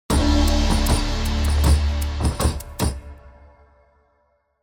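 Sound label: game background music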